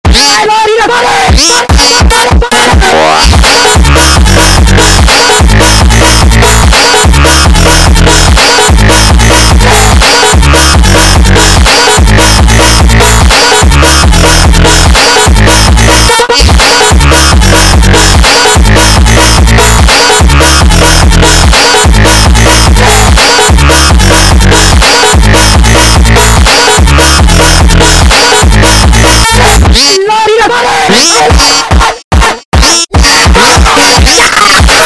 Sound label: sped Up